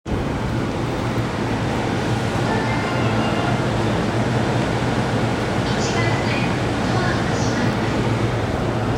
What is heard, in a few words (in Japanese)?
JR 横浜線 片倉 北